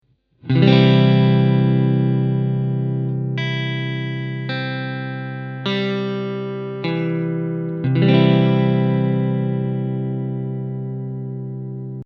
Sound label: C Chord, guitar